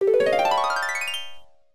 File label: harp gliss; Nitrods